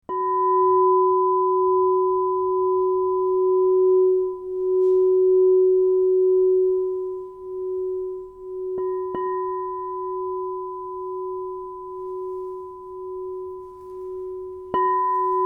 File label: Crystal Tones Singing Bowls